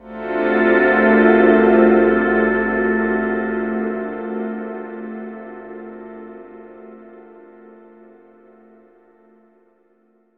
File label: Crunchy Bed, Strings & Pads; Zero-G Sound Sense Grime Scene; 90 s Sample CDs